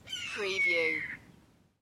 Red Tail Hawk Single Call

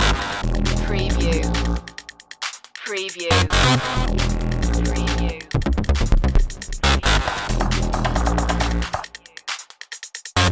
start again loop

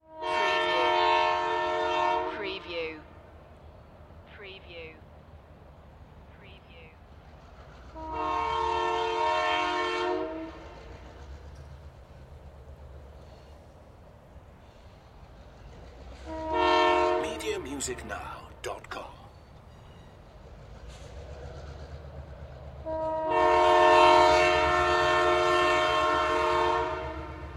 Train Approach Pass By; sound effects bible

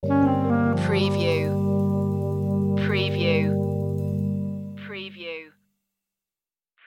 sting

